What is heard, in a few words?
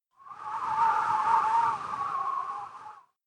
weather wind gust; adams venture origins